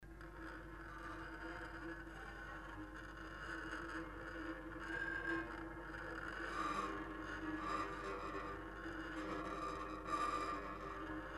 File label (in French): fils cordes metal, harpe